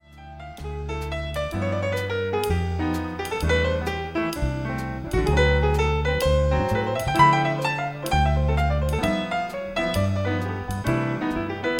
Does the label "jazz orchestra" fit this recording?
Yes